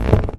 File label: rubber tire strain; physics; Source Engine; cstrike